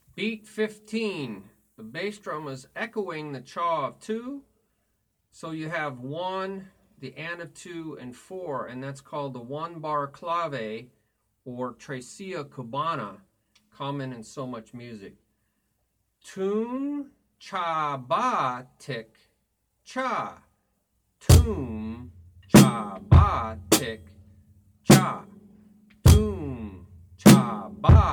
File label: Beat 15 1 2 3 4 Toom Cha Ba Ti Cha